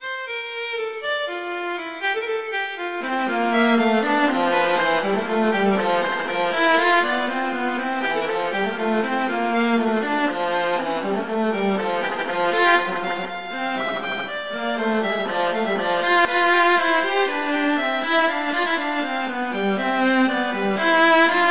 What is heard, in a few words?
Allegro; Telemann; Canonic; Sonata 5